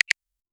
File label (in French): pour une application de l, click